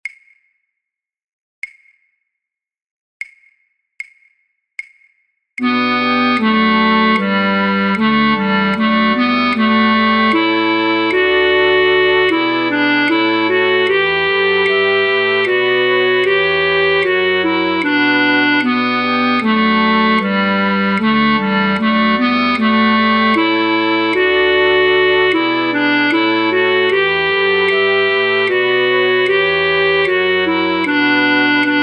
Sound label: flute choir, Altfluit 2A, Altfluit 2B; voor oefentracks; Matthaus